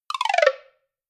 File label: notification; samsung Aleart